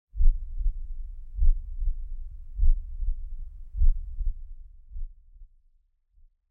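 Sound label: sounds of a drowning person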